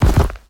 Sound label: Step Enemy Boss; Game rip; MiSide